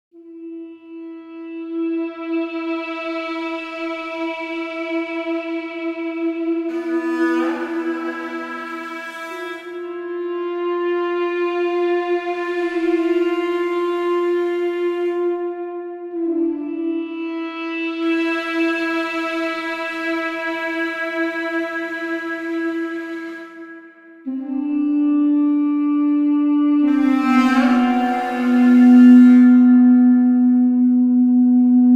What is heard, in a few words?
desert sand duduk